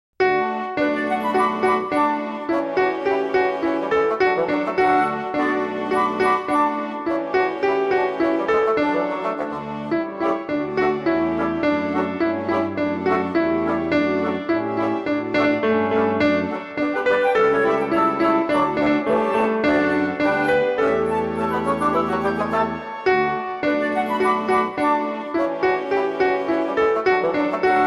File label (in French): Dodo l'enfant do Instrumental